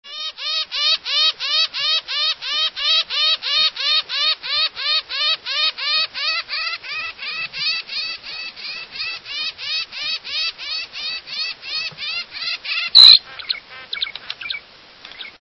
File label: Northern Shrike